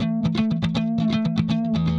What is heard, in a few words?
Clean Guitar Strum